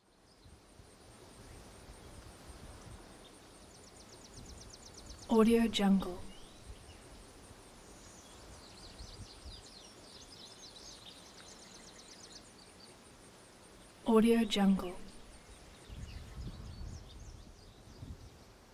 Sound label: gentle breeze